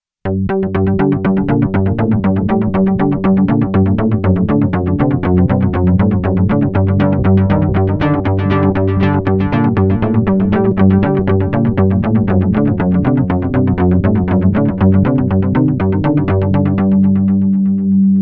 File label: BASS Phat Accent Delay